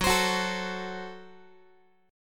F sharp Diminished F sharp, Guitar; strummed; x,14,15,16,13,x; 4A,4E,5A,5D,5G#,6C#

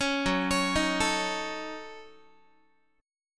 Gb Minor 6th Gb down Guitar; Gbm6; arpeggiated

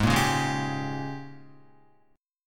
Ab Major 7th Suspended 2nd Suspended 4th, Guitar; strummed; guitar chord; 3,1,1,1,1,3; JGuitar

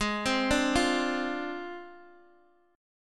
Ab 6th Flat 5th, down, Guitar, Open G

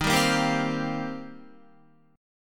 Eb 6th Add 9th, Guitar; Eb6add9 strummed; 10,10,10,12,12,13; JGuitar

